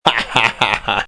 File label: Knife kill